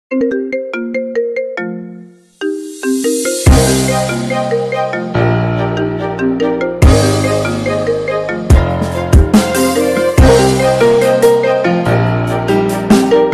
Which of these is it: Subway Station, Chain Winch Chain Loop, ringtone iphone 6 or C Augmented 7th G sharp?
ringtone iphone 6